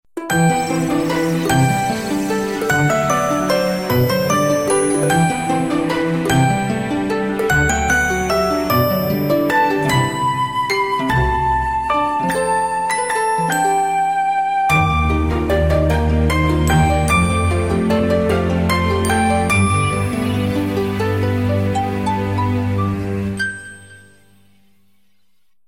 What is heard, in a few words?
musical harp ringtone; samsung